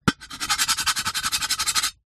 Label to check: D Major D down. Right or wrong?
Wrong